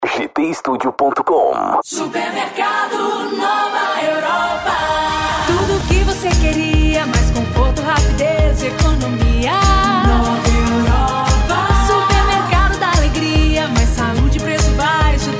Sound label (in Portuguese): jingle para supermercado; nova europa